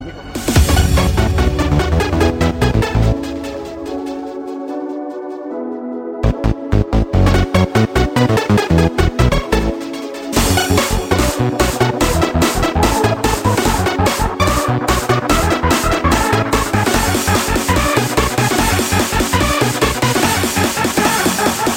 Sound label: Ringtone Mix